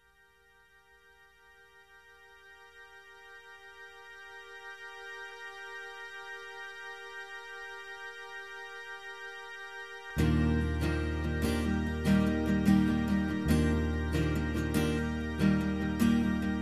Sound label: Hammond Song